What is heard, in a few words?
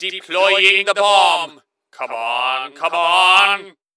Bomb Alert; Gray Mann MvM